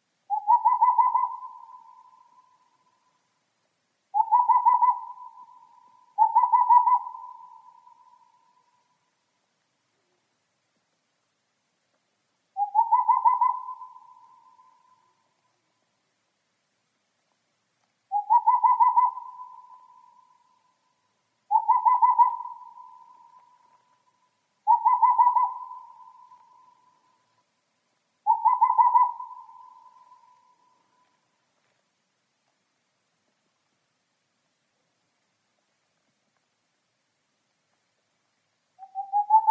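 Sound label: boreal owl voice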